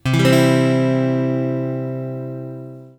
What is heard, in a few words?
C chord, guitar